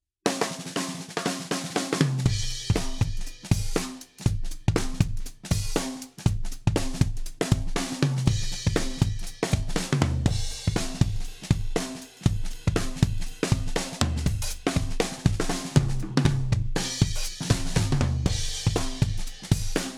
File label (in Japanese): ドラムサウンド ハネるビート